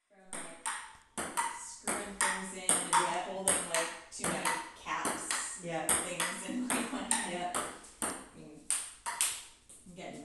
playing ping pong

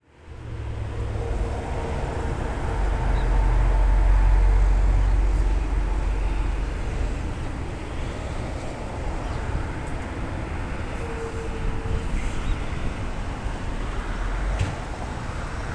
birds and traffic at a Pennsylvania rest stop

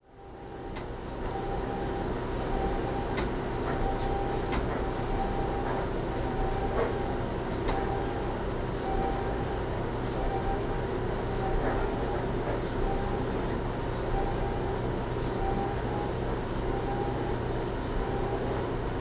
the dryer that caught fire

